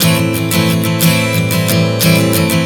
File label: Strum 090 Am, One Chord Strumming 090 bpm